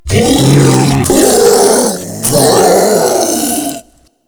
Infested Ancient Toxic Tentacle Swing Scream

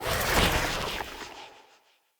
Casting Device Projectile Whizzby; Weapons; Player; Fenn; Casting Devices

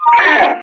Enemy Pain; Warframe; Lasrian; Battle Barks

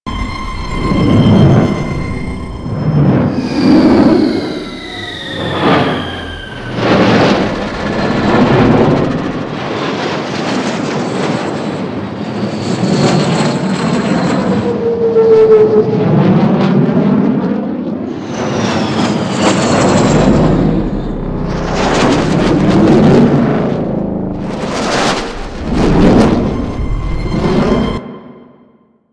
Ship Pass By Loop Slow, Engines; Warframe; Vehicles; RailJack Enemy Ships; Corpus; Engines Generic

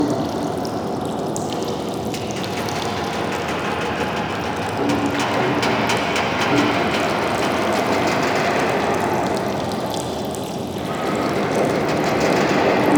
Sewer Amb Loop; Warframe; The New War; Orb Vallis; Narmer Fortuna

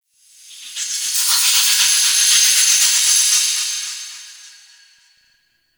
Small Metal Echo, Ambience; Warframe; Entrati

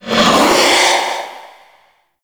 Reaper Vocal Jump; Warframe; Wraith

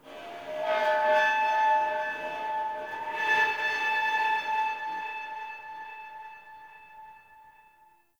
Metal Scrap Texture Med, Corpus Ship ambience; Textures

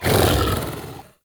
Melee Grunt; Enemies; Vocals; Warframe; TheNewWar; Archon; Amar

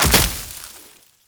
Tenno Land Heavy Veg, footsteps; Warframe

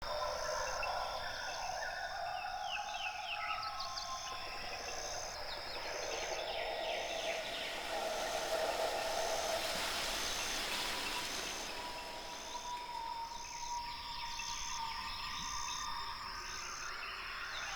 Day Amb Loop, Ambience, Glades; Glades Of Andurin

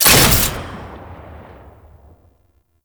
Shot; Fulmin Prime; Weapons

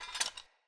Foley Action Metallic Punch Fill Short; Arm

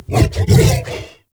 Juggernaut Found Initial; Infested; Truffle Hunt

